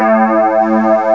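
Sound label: flanger guitar; GUITARS; M8; CMI Factory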